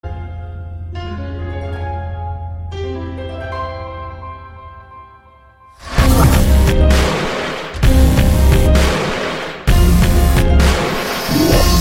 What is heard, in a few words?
sickest tone ringtone